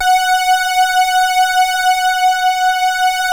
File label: JUN 106, BASSES